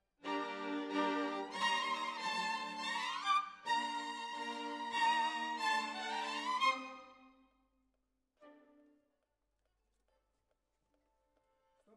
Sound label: Violins, jazz for piano